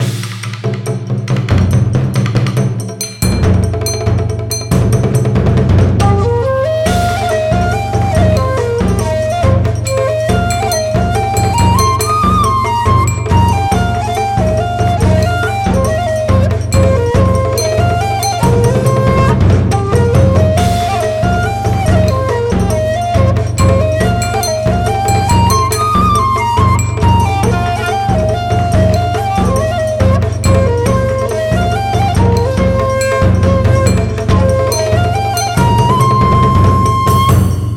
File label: Instrumental